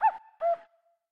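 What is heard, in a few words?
bird cuckoo; kakko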